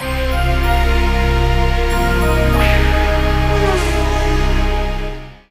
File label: game start; msx; f1spirit